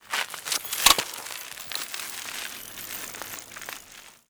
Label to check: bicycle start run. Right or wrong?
Right